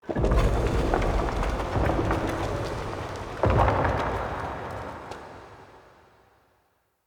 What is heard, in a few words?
Puzzle Rune Hit Room Shake A; Circade Community